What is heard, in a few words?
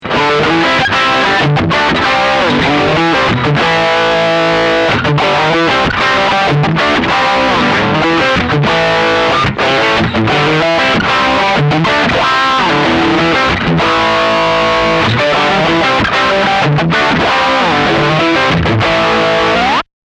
Pedaltone + Power